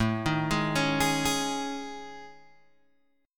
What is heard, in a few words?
A Major 7th Flat 5th, Guitar Open G; chord